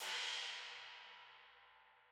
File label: CRASH LOW LOW CRASH, analog recorded drum; Sonimus; STONEQ 4K; SATSON; SATSON CS